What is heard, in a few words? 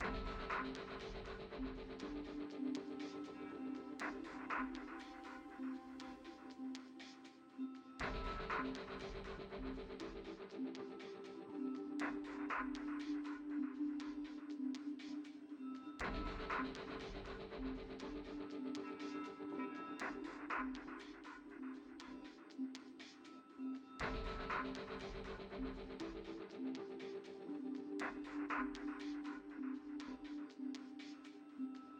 musique menu intro loop; gladiabots